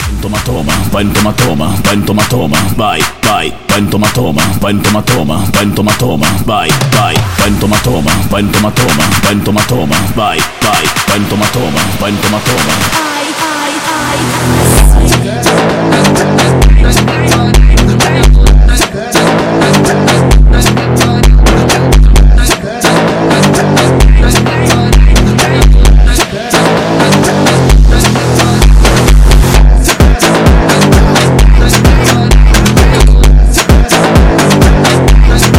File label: Phonk Music; فانک phonk